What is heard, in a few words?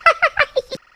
hunter pain; huggy wuggy snpc; elmo